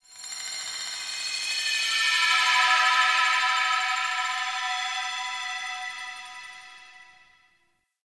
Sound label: Glassy Texture, Ambience; Warframe; Shadowgrapher